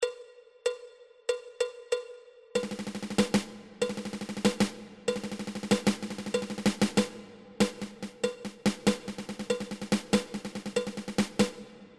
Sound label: Ten Stroke Roll